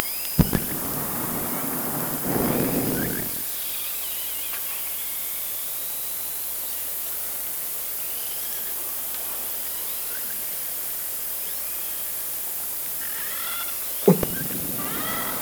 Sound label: Orca sound Night Passby